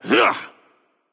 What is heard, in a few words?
grunt attack; Smite; Horus